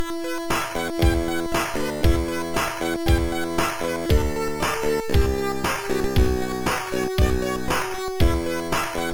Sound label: jingles; Customplay; thunder blade